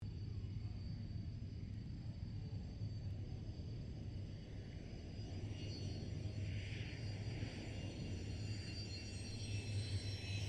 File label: AIRCRAFT CA-27 SABRE TAXI SHUTDOWN; CA-27/F-86 Sabre; Airplanes; Vehicles